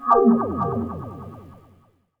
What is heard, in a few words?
TRAP TRANSITIONZ